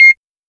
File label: hunt, stabs & hits